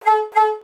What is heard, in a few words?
horn double